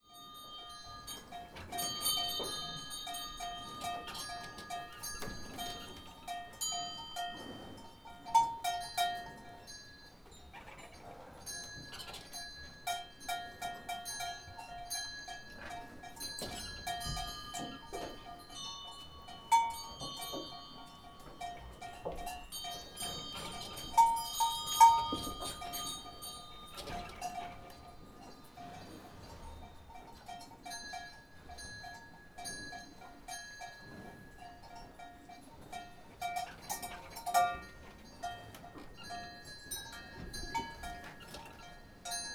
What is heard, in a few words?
Stall Omni; Mics: Stall (xy)